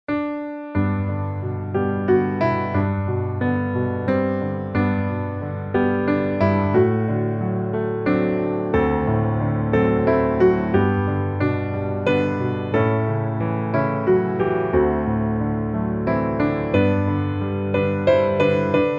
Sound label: piano